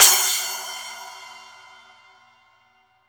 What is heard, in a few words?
SPLASH, Drum; East Collexion; Drum 1 Dry